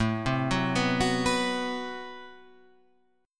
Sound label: A Minor 6th Add 9th, Guitar, Open F; Am6add9 arpeggiated; 9,7,6,6,9,6; JGuitar